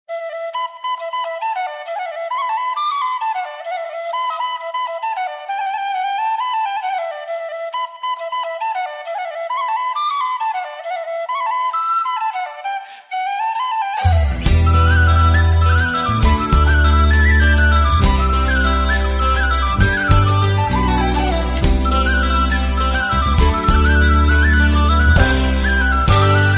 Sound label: algerian flute